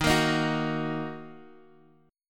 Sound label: D sharp Minor, Guitar, Half Step Down